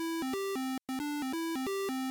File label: 8 bit intro